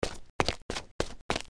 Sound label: classic unity footstep